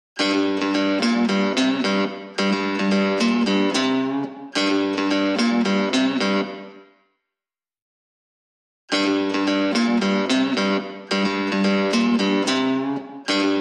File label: Guitar